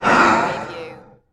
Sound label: Alien Breath; sound effects bible